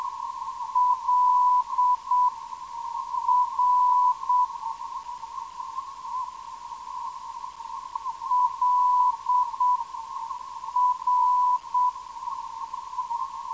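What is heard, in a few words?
770kHz, non-directional beacon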